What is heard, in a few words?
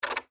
Door Hinge Pin Out